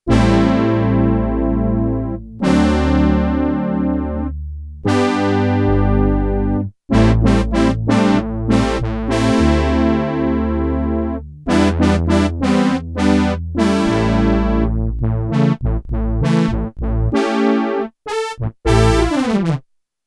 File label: BRASS I, Korg DW-8000; Factory Patches